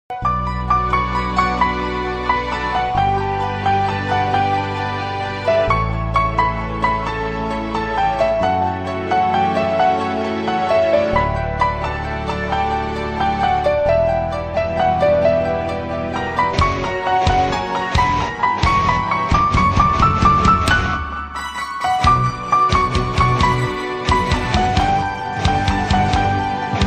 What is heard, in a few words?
instrumental ringtone love instrumental ringtone